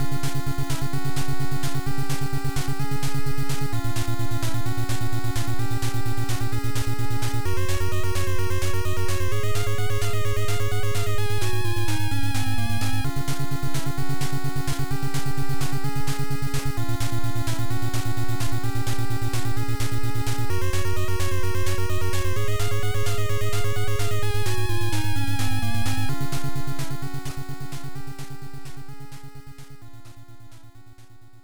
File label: Timer Low; Tecmo Cup Soccer Game; NES